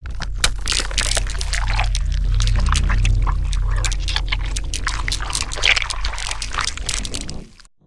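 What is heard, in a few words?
alien life form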